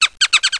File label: MOUSE; PUZMANIA